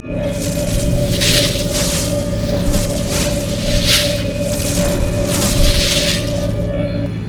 passive buff; LoL; vi

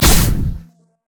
overload; LoL; ryze

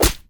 vs flesh; LoL; masteryi

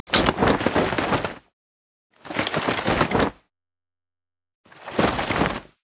emote recall; LoL; twitch

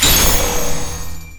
power transfer return; LoL; viktor

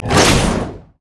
flamebreath human; LoL; shyvana

